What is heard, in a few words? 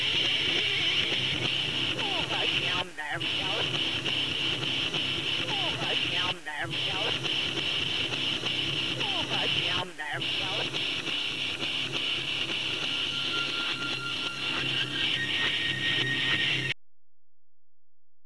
backwards shovel